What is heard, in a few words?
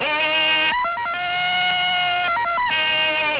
tarzan